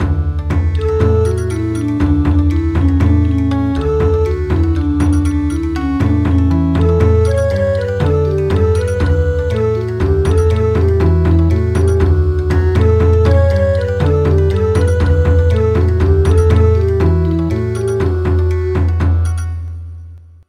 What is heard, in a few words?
Bon Odori; midi traditional; Your handkerchief